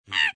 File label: AV monkey short; Toontown Online